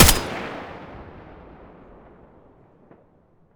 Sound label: M4A1 muzzle mono